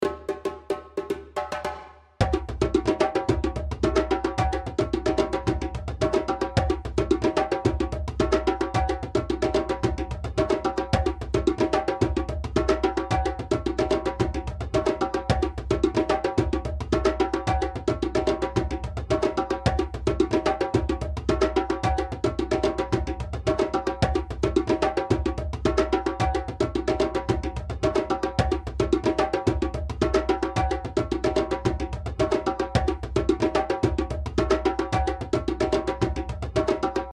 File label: fanga djembe all; rhythms